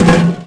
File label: bouncy plastic chair hit; bouncy objects; Tony Hawk's Project 8; XBOX